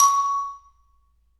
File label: xylophone c#4; Percussion; Sonatina Symphonic Orchestra